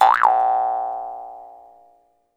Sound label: Jaw Harps